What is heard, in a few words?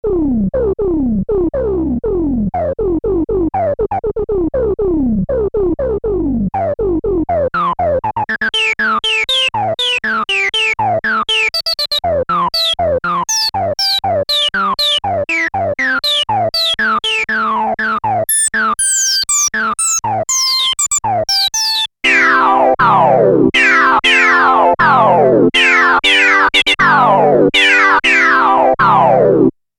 ensoniq sq-80 filter lpf q200